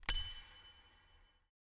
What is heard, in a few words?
Coin Flip